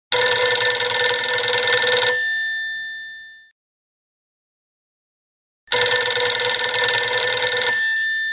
Classic Phone

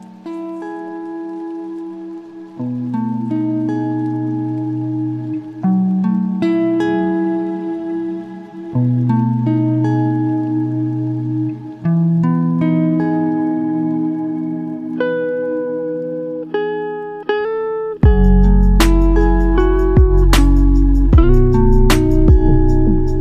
Shimmer

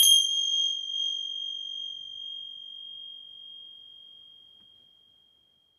Tibetan Bells

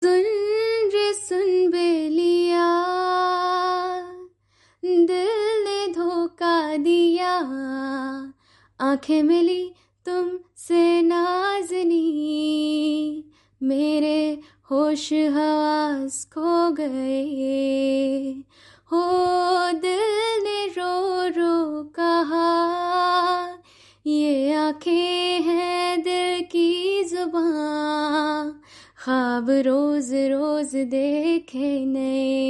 Female Version, Hindi Song; Tamasha

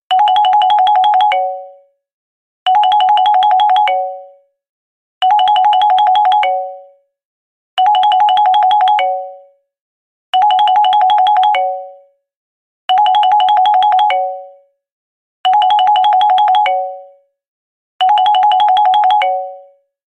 Simple Ringtones

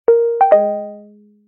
Oppo Notification

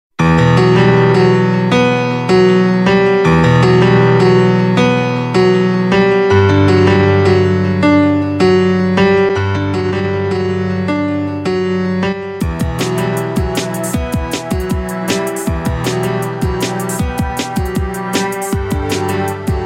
Latest Echo Piano

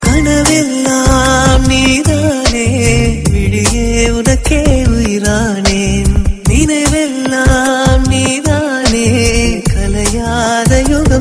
Love Feel Ringtone

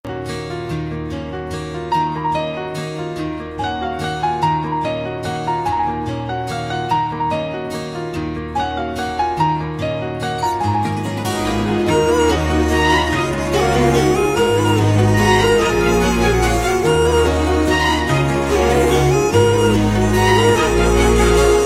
Vip Melody

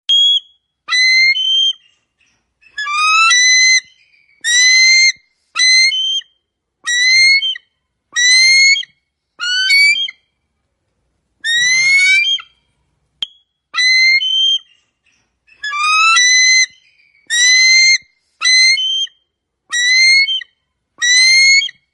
SUARA BURUNG ELANG Eagle voice

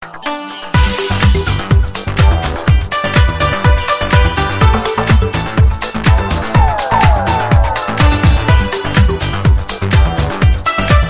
Bolly Techno

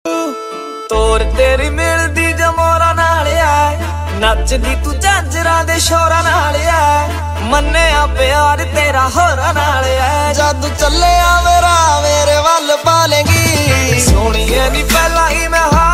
ringtone

